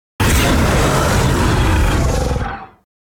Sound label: Monster Beast Wounded - Growl; Hollywood Edge; sound designer's toolkit v2